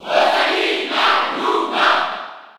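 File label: Cheer French, crowd; Rosalina & Luma; SSB4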